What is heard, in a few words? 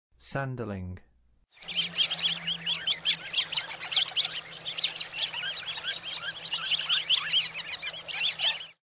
Sanderling, Songs of Birds of North America; Western USA; Western USA Songbirds